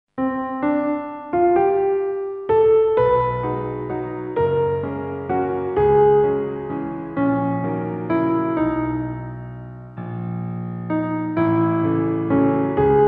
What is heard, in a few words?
tone row waltz